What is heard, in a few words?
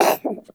Kitten Cough Hairball; Enemies; Warframe; Catbrow